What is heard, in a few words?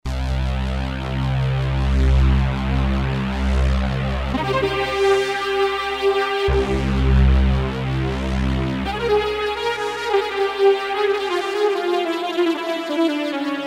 Roland JV-2080 FXM Saw Lead